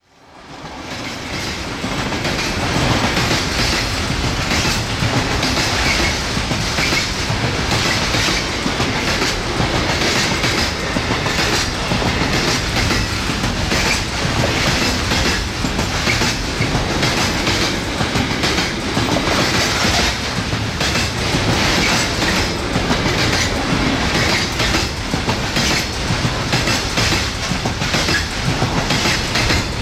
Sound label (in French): bruit joints de rails; sonotheque